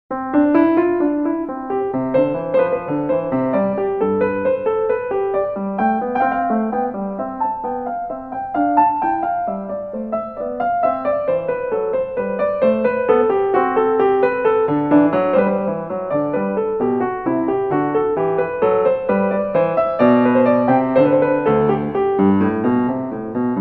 Invention In C Major; Marlais Book 6

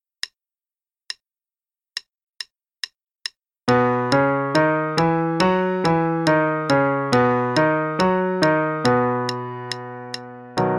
Warm-up in B (Major then minor, qn=140); minors are staccaco; Faber; Level 2A, 2nd Ed p.63 - B Major, then b minor

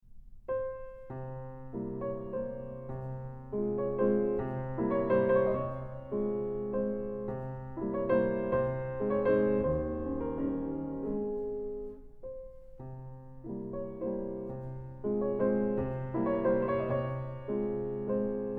German Dance in A-flat Major; Magrath Book 08

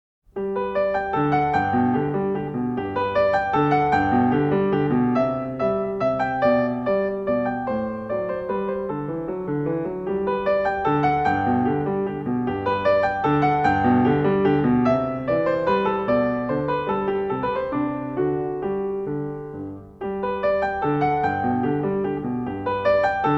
Menuet In G Major; Magrath Book 04

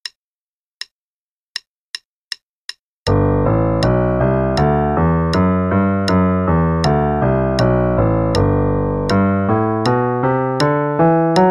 G Scale Warm-up, qn=160; Level 2B - 2nd Ed

